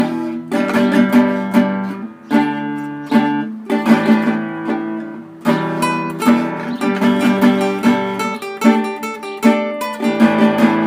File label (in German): gitarre